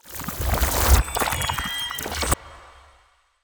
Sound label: Ice Spell Utility Unlocking; Utility Spells; Arctic Aura